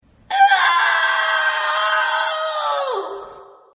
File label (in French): cri douleur